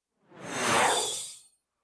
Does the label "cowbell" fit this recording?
No